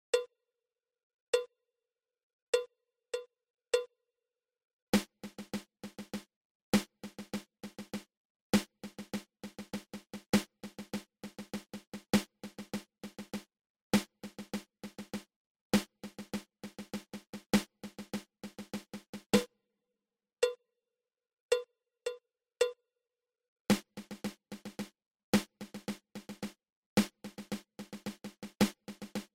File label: Double Ratamacue